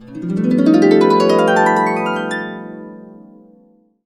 B, Harps; USB Soundscan vol.62; Complete Harps; AKAI

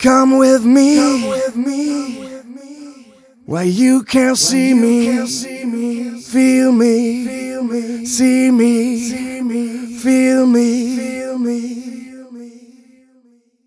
130 mel g, Vocals

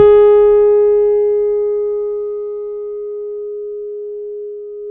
G#4 MP, Piano cp80

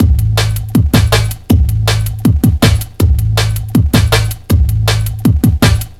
160, Drum Loops; Total Drum&Bass

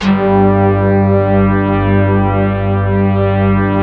SYN DX41, Roland; Sound & Vision - Gigapack I CD 2

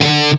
METAL SINGL; East West; Global Sounds

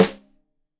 Rim Shot Stereo, drums

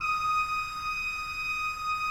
D5, Cathedral Organ, ROHRFLOETE; Propeller Island

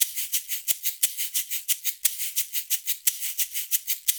LOOP, SHAKERS, Percussion

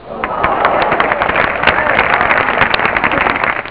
spectators; ST-XX Amiga Soundtracker Sample Packs; klax

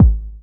DR. LOOP, DRUMS; X-Static Goldmine 3

